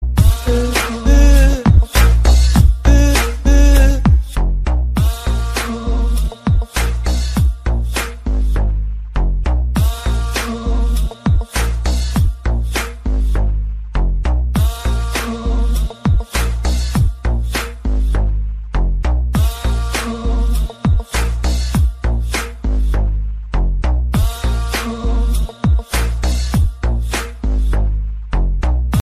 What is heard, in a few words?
trap ringtone